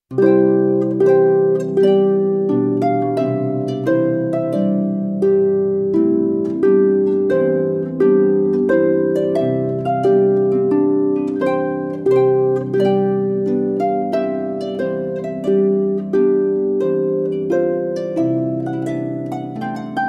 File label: Trumpet Tune, harp